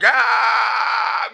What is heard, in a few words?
growl, Voice